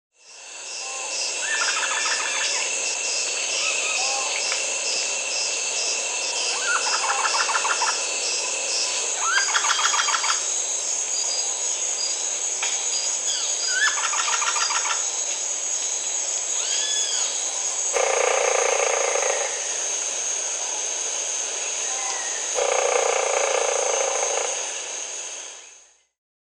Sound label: Rufous-headed Woodpecker (Celeus spectabilis), Peru; S. America